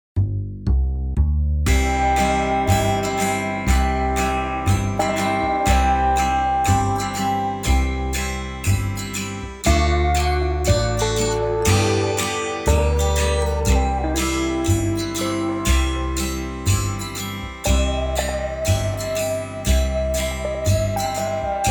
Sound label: Country Guitar; Learn Country Guitar